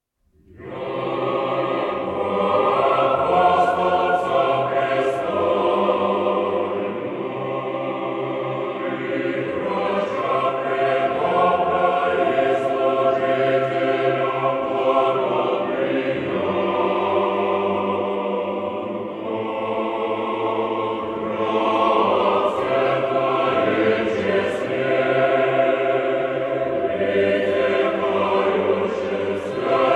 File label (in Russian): Hor, Tropar; Hor Bogoyavlenskogo Kafedral'nogo Sobora; Tropar' svyatitelyu Aleksiyu Moskovskomu